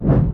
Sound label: jump; swampboat